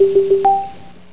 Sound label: chime